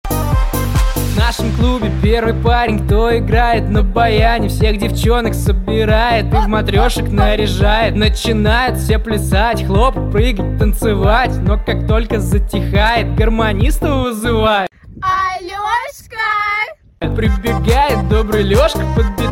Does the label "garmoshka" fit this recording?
Yes